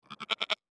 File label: goat; soundscrate